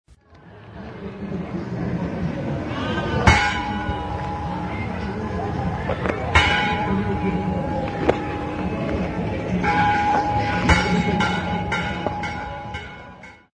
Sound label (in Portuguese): festa do rosario sinos